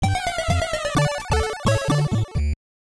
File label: Lose Life